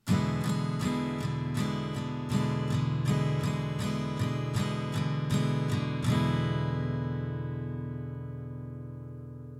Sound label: Straight strumming pattern, guitar